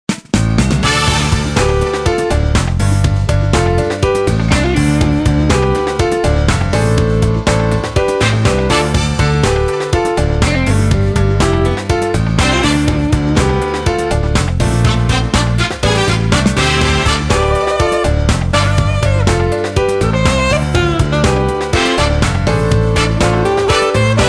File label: Disco; Network Production Music; NM285 I Had A Great Day